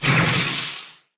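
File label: impact bullet energy titan shield arc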